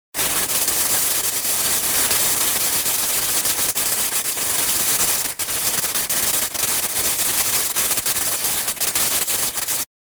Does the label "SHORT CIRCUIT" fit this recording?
Yes